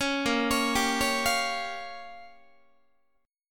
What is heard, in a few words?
Bb Minor 6th Bb down Guitar; arpeggiated; JGuitar